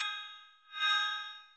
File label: notification sound